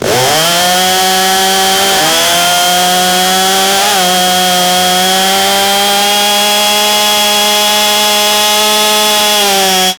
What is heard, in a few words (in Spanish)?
sonido de motosierra; woodworking